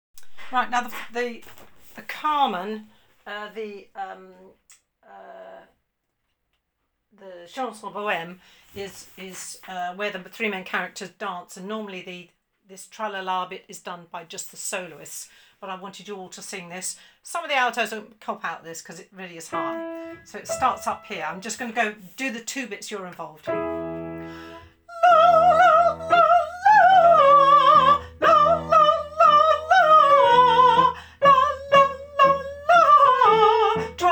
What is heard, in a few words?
unison